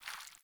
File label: Fawn Footstep Water, Foley; Animals; Ambient